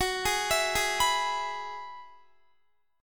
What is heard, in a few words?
Gb 7th Suspended 2nd Suspended 4th, Guitar; Gb7sus2sus4 arpeggiated